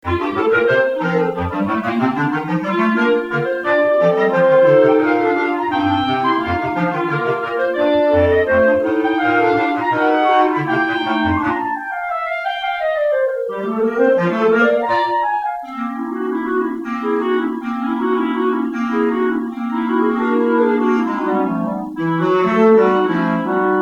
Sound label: Clar Quartet